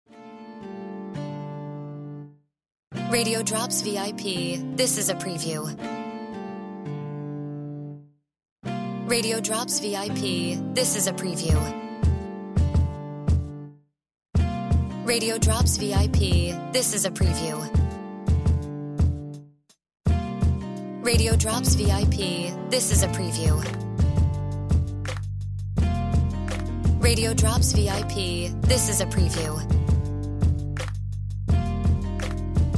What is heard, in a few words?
Music Bed